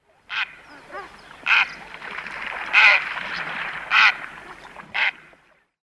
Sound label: Purple Heron